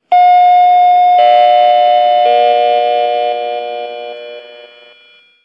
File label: chime; polestar